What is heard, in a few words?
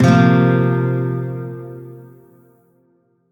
Shawzin Hirajoshi Chord A; Music; Warframe; Lotus Shawzin